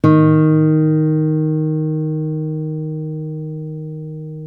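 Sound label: acoustic finger D#2, guitar; East West; Steve Stevens Guitar Samples Collection; ACOUSTIC FNG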